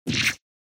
Cut

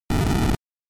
Magic Fire, NES RPG

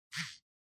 Cell Phone Vibration Hand

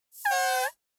Poo Poo Cushion

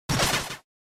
Attack, NES RPG

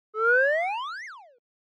Motion Pop

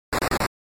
Run, NES RPG

